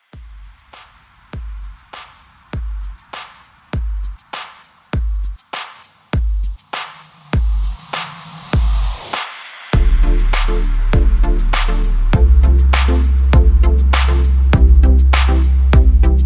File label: ReDrum 100 BPM